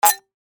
bicycle bell

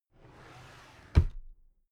drawer cabinet carton close regular

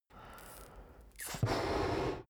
balloon blow breath in

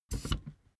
office chair hydraulics down stepwise

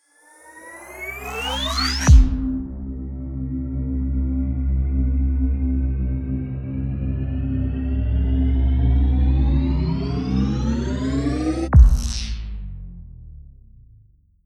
promo pad

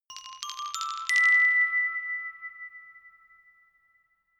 notification glockenspiel rattle sequence ascend

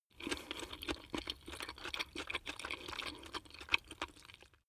chew spaghetti fast

